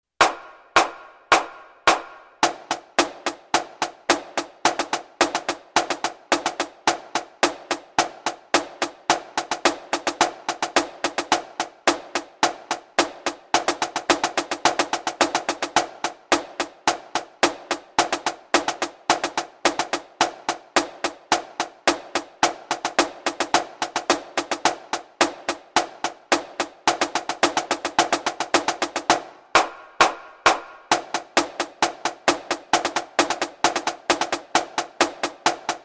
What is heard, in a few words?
paradiddle